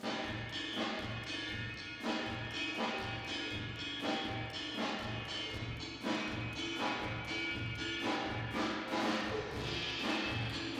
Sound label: Drums Verb